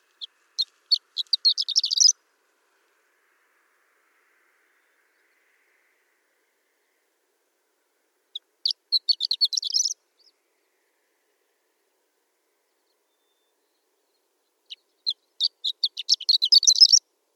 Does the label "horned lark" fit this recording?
Yes